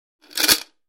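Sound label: sounds of chains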